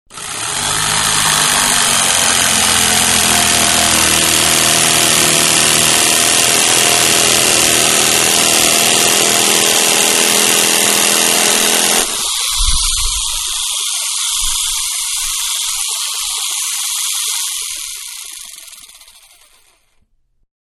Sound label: Jigsaw Cutting Short; Saws (Sound Effects:Machines); Manhattan Production Music